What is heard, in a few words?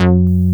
Xpander bass, OB Xpander; Greytsounds VOL-1